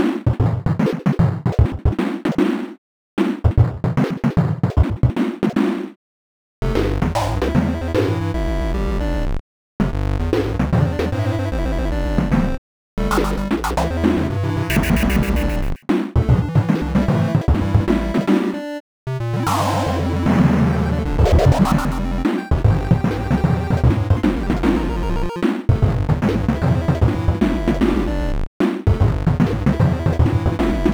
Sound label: weird beepbox song